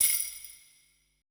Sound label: E SHELL, ETH PERC